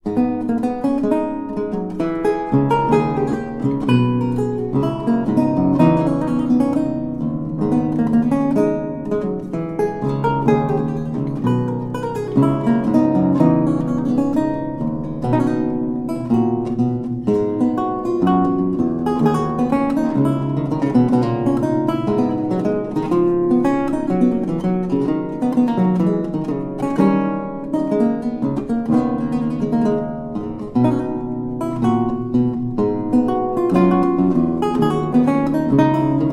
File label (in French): Gavotte, Luth; Charles Mouton